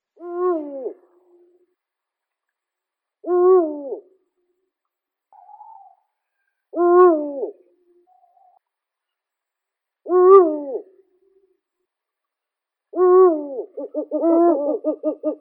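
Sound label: eagle-owl bubo bubo; filin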